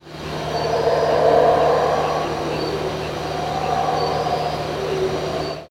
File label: howler; with their deep, breathy roars; Belize; howler monkeys; like the breathing of Dementors